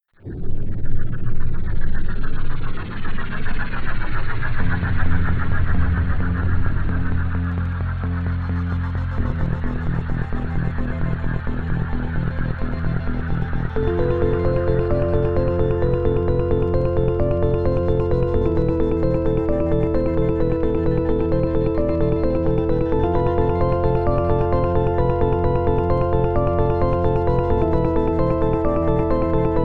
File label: vortex soundwaves